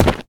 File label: dirt land; Golden Crayon Sounds